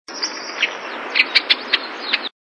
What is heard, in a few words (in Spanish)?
voz tordo pico corto